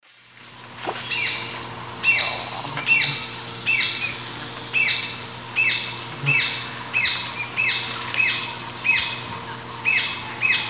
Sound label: Galbula dea, start and end of song; S. America; Eastern Lowlands